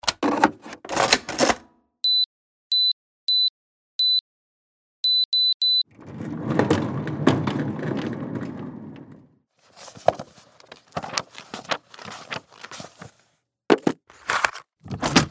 office building

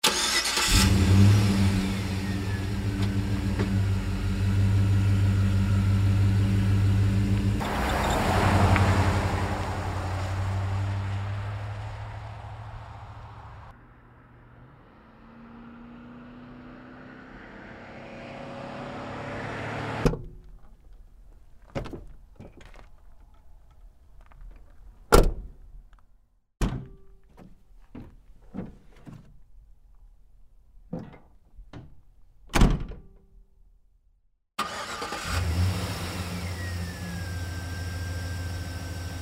large cars and trucks sound fx

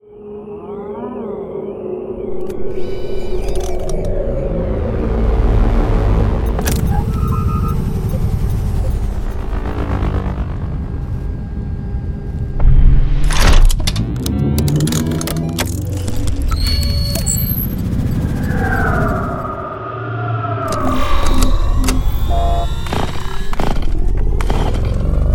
futuristic vehicles and engines

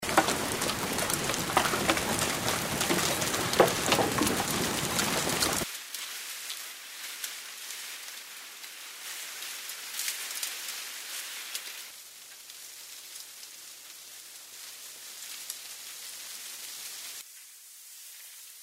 wind and rain sound

